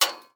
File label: Sign Impact; Hollow Knight; Banker Sign Impact